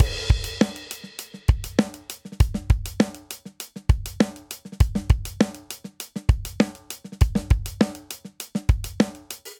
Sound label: Linear Funk Verse 100 bpm, Drum; 4 4; MIDI Clips; Ableton; Factory Packs; Drum Booth